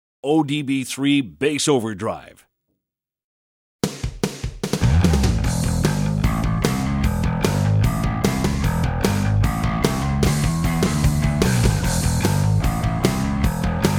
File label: tone demo bass; ODB 3